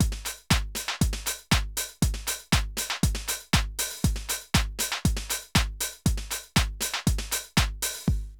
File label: drum loops, House & Garage